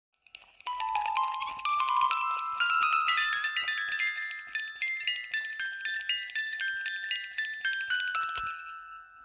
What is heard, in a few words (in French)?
La marche Turque, manivelle